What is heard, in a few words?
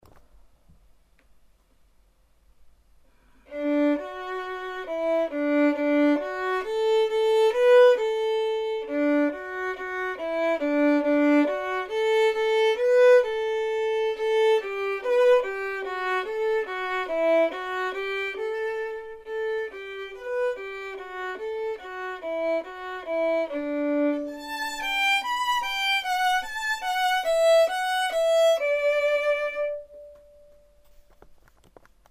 vln perf